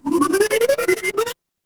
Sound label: retro game power up